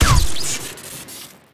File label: sniper rifle crit; weapons; tf2